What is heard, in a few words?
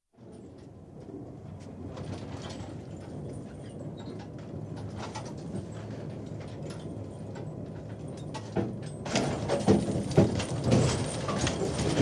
Beam Fishing Trawler, trawl gear lowered into water; Boats; BBC